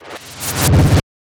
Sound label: stylized glitch sound; soundscrate